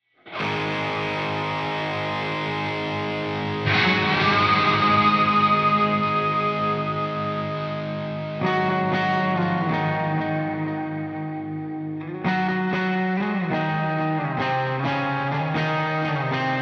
b gtr

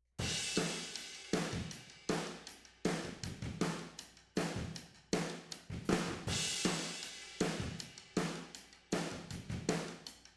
toms, ovrhds, room

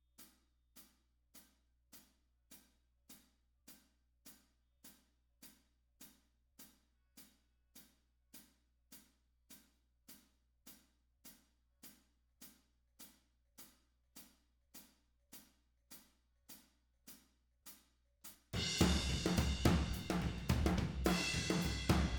toms, ovrhds, room

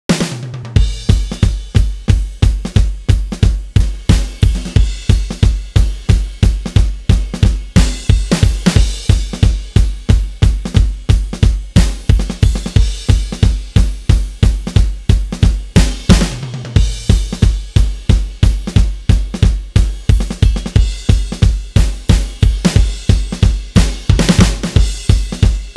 drums

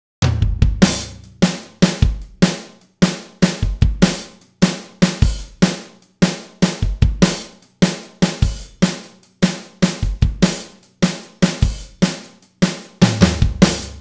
drums

